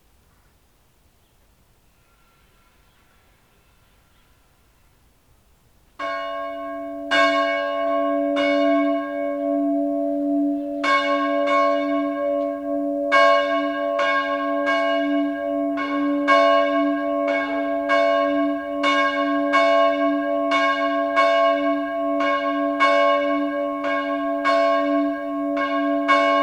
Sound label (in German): glocke neuendorf